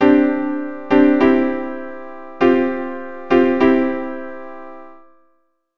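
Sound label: Suspended chords, keyboard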